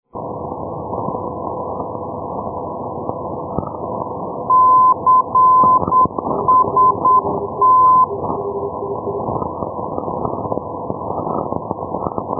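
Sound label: ndb, non-directional beacon; palma-costix cst-351; ndb perpignan pl; europe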